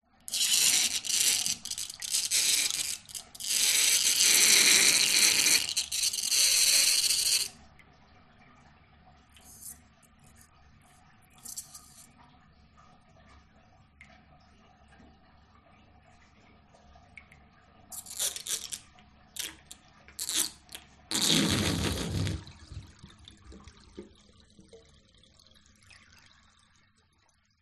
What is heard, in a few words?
bathtub drain gurgle high pitched; household